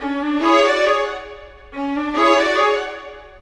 Cinematic Viola Violins; Orchestral; Sonic Foundry (Sony Creative Software); Orchestral Series VOL-3 - Cinematic

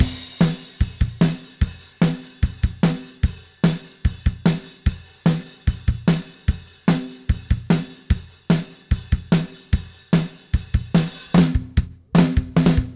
Beat Loops, 148; Zero-G; Monster Beats

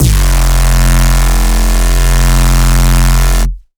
NEURO PUNCH, bass

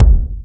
BD, drum machines

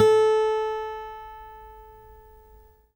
AC MEDSUSPAM, Guitar; Quantum Leap; Guitar & Bass

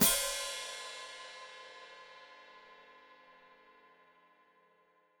Hat Closed Open, Hi-Hat

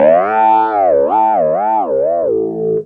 Clavinet; Ambient Grooves

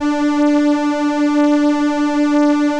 ELKA, String Machines; ELKA RHAP

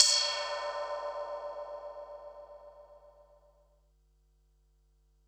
HH, Pearl SC-40 Hihats and cymbals

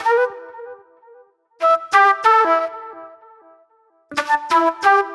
Sharp Delay Flute

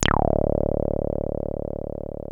303 C2, TB 303, Synthesizer; Hollywood Edge; Giorgio Moroder Rare Synthesizer Collection

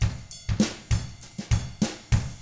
FUNKY, Drums; ILIO; Double Platinum Drums 1; 99 FUNKY 2

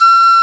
Pan Flute E5; Planet Earth; Digital Sound Factory Planet Earth

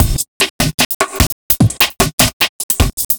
Funky Beat, Drum N Bass; Sonic Foundry (Sony Creative Software); Knutrix Drum & Bass

